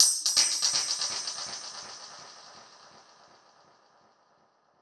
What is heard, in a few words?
Perc Hit, dub percussion; musicradar